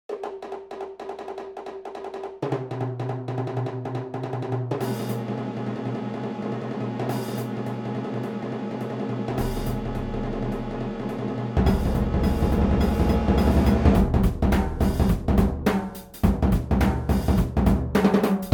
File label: Punk Funk, percussion; Some Punk Funk; commissioned by the Campbell Unified School District (Campbell, CA)